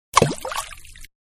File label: Splash Small Stone Dropped Into Water; Rocks; Materials; Manhattan Production Music